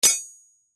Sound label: Pipe Hit Rocks Tool Wrench; Machinery; Foley; Blastwave